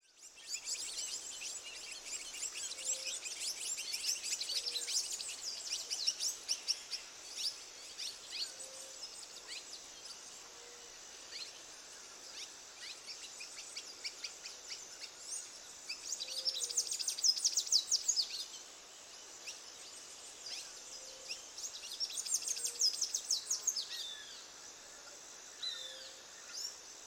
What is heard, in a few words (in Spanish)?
SELVA DÍA MONTE TARAPOTO AVES E INSECTOS